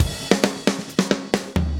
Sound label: 133 Surf Slow Drums, Pop Rock, 133 bpm